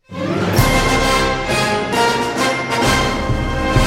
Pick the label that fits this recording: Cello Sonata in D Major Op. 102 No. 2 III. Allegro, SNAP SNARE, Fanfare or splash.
Fanfare